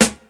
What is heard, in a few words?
hip hop snare drum a sharp key; snare drum samples